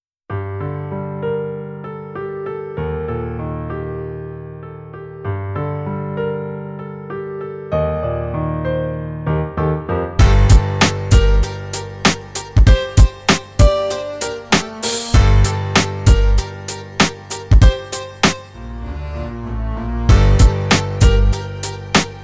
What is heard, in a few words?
Beat bar rocker